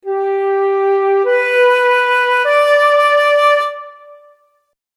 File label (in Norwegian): Fløyte